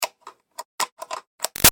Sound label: 1-4 Inch Plug In Electric Guitar Fiddles; Switches; Objects